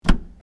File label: car trunk open